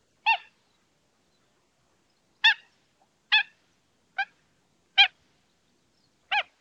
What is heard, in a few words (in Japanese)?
seitakashigi